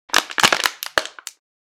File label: Plastic bottle squeezing and crunching; gfxsounds